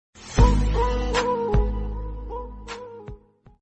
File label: basuri message notification